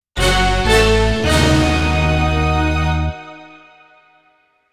Dun dun dun shock transition suspense, cartoon orchestral